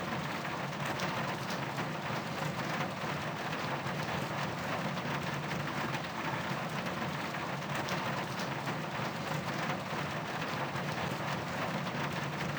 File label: Rain Roof; zps; Ambient